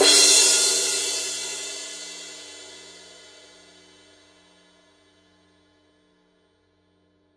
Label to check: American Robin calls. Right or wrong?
Wrong